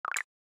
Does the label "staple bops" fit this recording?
Yes